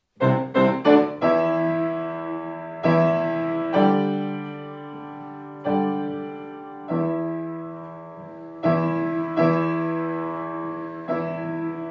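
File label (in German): Klavier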